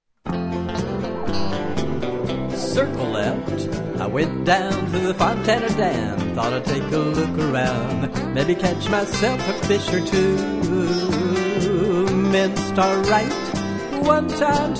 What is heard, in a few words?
smokey mountain air vocal